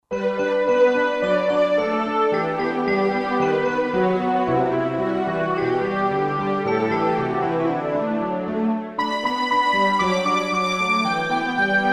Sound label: Last Noel, Roland SR-JV80-16 Orchestral II